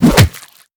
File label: Fishmen Macemen Bulava Attack; We Are the Dwarves